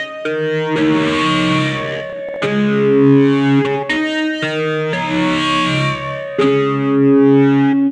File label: Harmonic Distortion, Keyboards